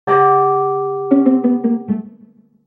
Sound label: Rollgoal Failure; the legend of zelda twilight princess